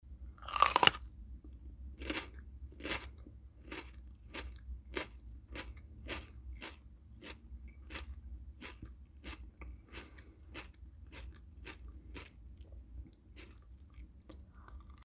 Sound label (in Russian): bolgarskij perec; Звуки откусывания